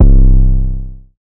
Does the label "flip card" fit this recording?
No